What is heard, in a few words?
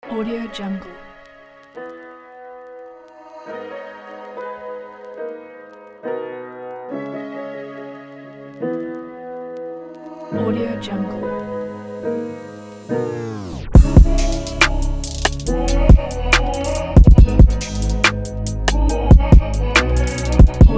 sexy erotic soul trap beat